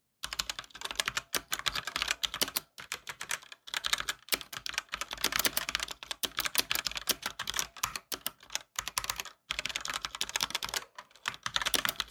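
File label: fast typing on mechanical keyboard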